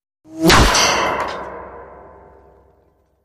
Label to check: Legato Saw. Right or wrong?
Wrong